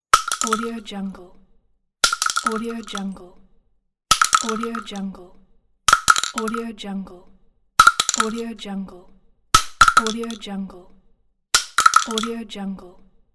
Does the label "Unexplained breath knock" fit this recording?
No